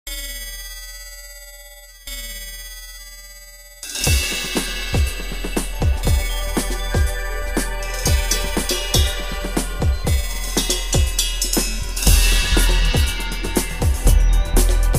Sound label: Ultra Beat